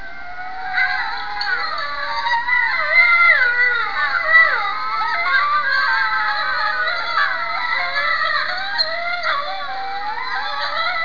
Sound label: wolves carry on